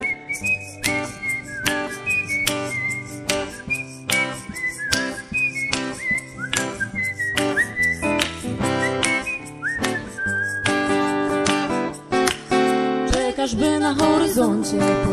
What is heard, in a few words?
blues